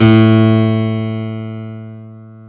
KEY HRPCR, Roland; Roland - LA Composer vol. 1

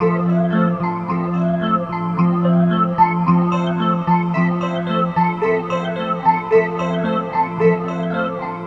Guitar Textures

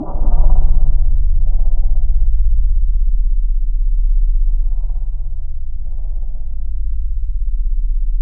C1, Synth Leads

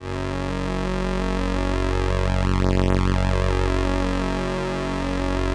LAMBDA, String Machines

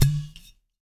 pot; Spectrasonics; Liquid Grooves; POTS + PANS

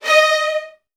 D#2, Strings, VN 16TH; ILIO; Kirk Hunter

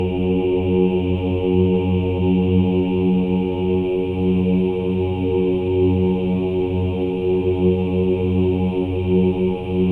OHS, Symphony of Voices; Spectrasonics

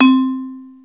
vibraphone, Yamaha Motif ES drum machine; 300 Drum Machines; Yamaha Motif ES-Series

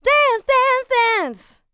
LICKS, Vocal R&B & Dance; ILIO; Vocal Planet

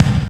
DS4 kick; E-MU Formula 4000 Series Vol. 2; OrbitPercMaster

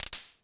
polyvox clap; Formanta Radio Plant

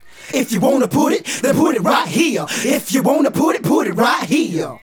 Male Group, Hip-Hop and RnB Vocals

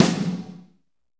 SNR; Northstar - Drumscapes Roland; Pwr Ballad

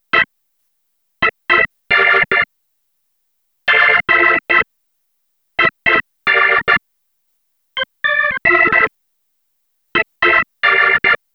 Hockey Organ Leads, Electro Lounge; Sonic Foundry (Sony Creative Software); 110 Strictly Legit

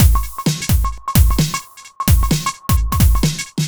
130 Real Logic Full, Drum Loops 130bpm; Zero-G; Soundclash Nu Dance Electro; Acidized Wavs

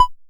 Synthe Drum, Daytone Drum Synthe RDS

